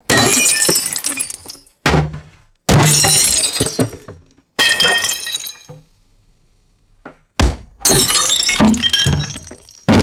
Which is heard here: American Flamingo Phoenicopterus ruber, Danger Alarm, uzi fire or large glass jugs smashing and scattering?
large glass jugs smashing and scattering